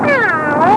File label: meowww